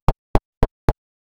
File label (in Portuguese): um passo em uma dungeon, pixel art